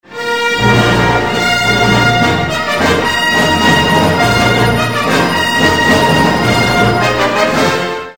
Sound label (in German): Jingels